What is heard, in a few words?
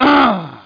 BL DIE